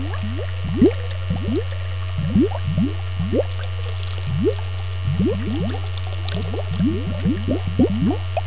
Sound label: aquarium loop